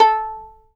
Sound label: fiddle pizz A4 R; Sampleheads; Whole Lotta Country 1 & 2